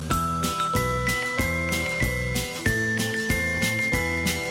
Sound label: Message Tones